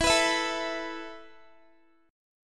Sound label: E Suspended 4th E, down, Guitar, 4E,4A,5D,5G,5C,6F; Esus4; strummed